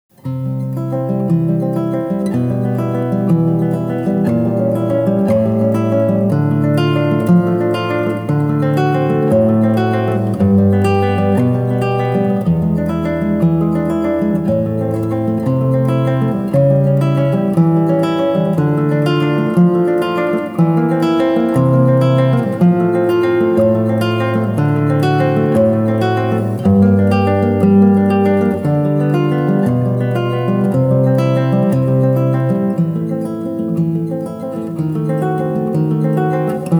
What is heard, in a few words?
etude c-dur, guitar